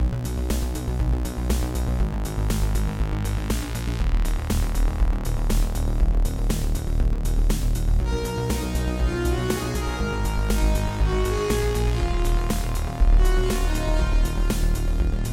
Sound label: Synth Wave Basics